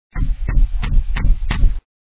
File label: knock